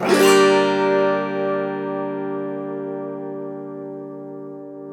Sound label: AUTO HARP, Auto Harp Min 7